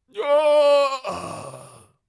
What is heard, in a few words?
Void Monarch Death Vocals